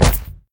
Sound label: pirate run pegleg; smite; sobek